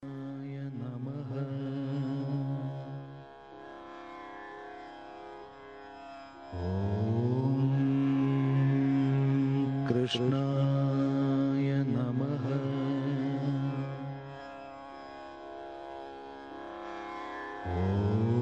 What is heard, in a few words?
Om Krishnaya Namaha Chant; Bhakti Sangeet